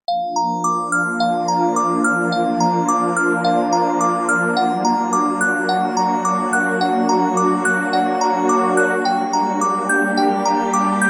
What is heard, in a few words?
Instrumental soft piano music